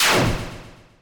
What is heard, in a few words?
roaring knight